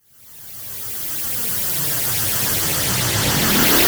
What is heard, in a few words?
Sweep, Fx, Sweeps, Trance; Zero-G Trance Inducer